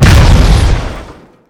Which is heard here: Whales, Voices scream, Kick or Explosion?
Explosion